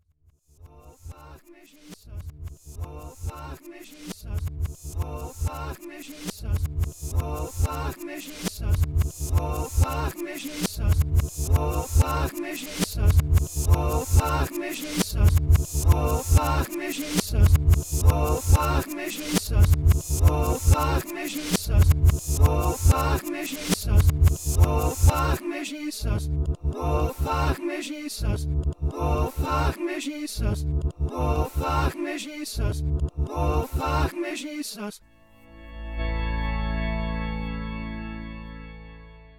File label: look no strings reverse